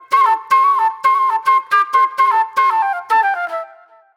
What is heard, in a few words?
Disco Flute; Sonic Foundry (Sony Creative Software); Manhattan Flutes; Session 04 - Cruisin' Disco